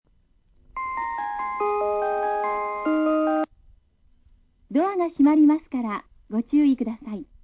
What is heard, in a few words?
melody